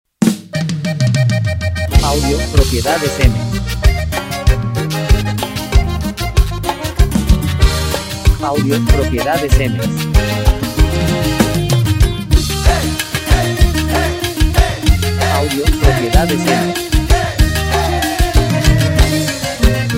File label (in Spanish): cumbia norteña